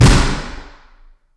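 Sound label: Lunch Room Center; Reverb; 29x37x12ft; 20ft; FL Studio 9; Fruity; Edison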